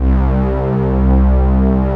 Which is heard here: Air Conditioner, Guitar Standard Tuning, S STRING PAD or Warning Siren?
S STRING PAD